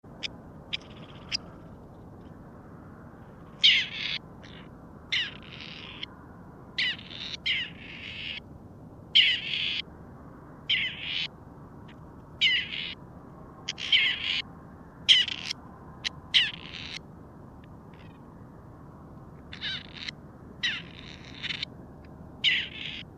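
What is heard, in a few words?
seraya kuropatka voice, grey partridge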